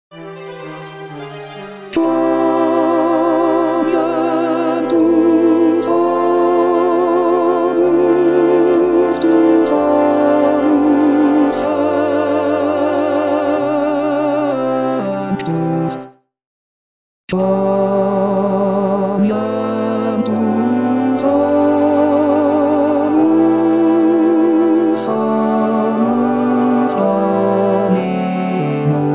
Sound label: Tenor; Puccini; Messa; Gloria; Quoniam